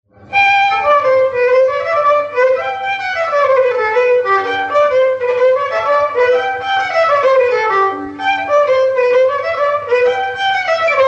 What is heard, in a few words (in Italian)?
ballo dei gobbi (violino)